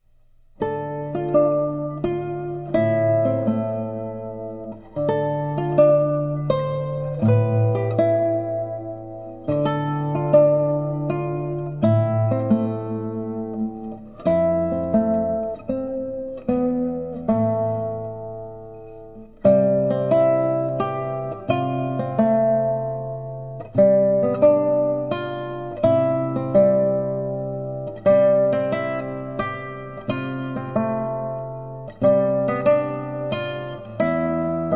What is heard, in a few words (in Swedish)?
Polska; Polska Fran Berg